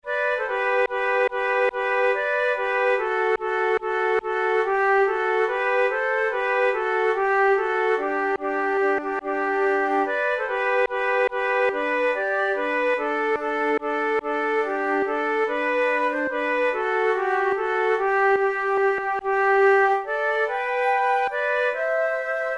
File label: duet, flute tunes; Oh it is not while riches